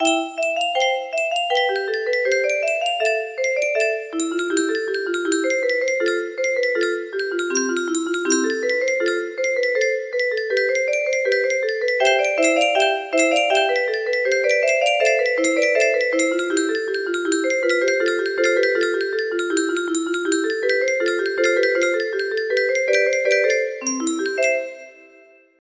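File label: canon in d, music box melody; pachabels; kikkerland 15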